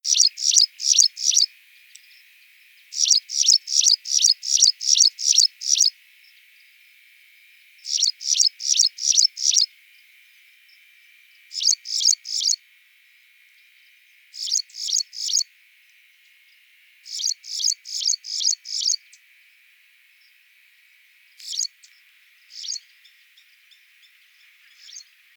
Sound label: Graceful Prinia Prinia gracilis